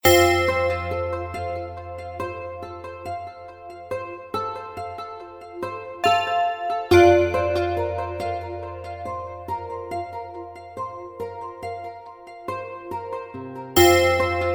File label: Bells, alarms; Hisense; A5